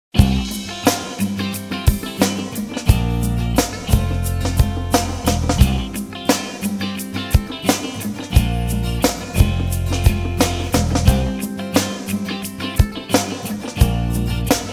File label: Joe Cool; Soundzabound Volume 4 Disc 2